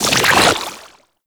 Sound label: Tidal Augment Deactivate; Warframe; Pirate